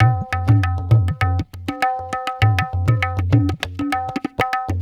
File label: Tabla